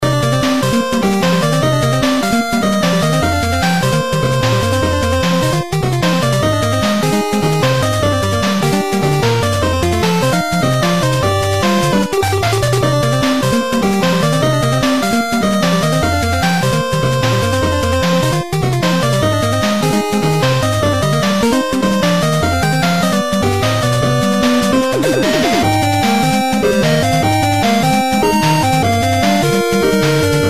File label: wily stage 3 loop